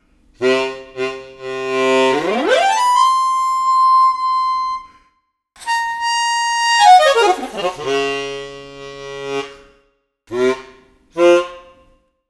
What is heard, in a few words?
alto